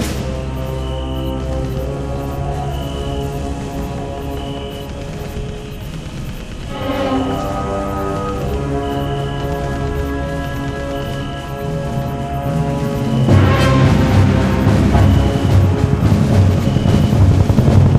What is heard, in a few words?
Front End Music; conflict vietnam windows gamerip 2004